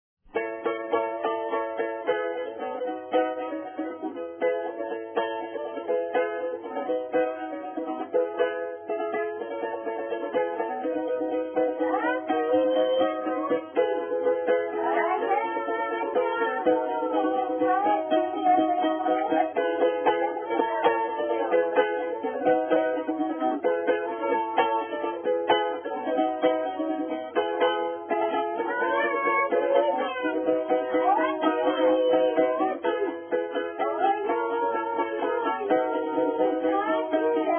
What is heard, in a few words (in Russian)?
русского под саратовские гармоники; с.Борки Шащкого р-на; Заиграли весело